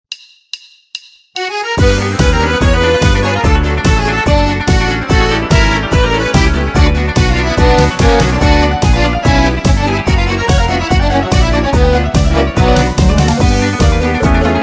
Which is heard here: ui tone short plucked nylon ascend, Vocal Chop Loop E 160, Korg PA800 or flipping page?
Korg PA800